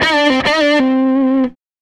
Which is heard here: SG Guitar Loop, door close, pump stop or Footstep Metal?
SG Guitar Loop